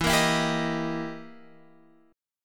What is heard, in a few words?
E Minor Major 7th Flat 5th, E, down